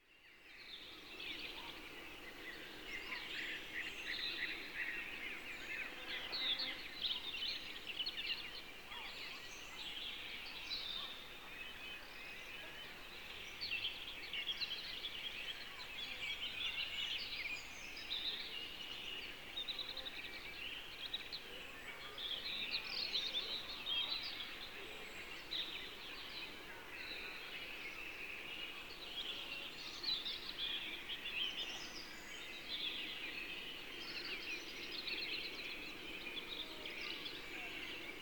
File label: Summer Birds